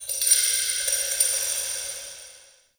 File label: Vendor Crystal Coin; Ambience; Soul Plane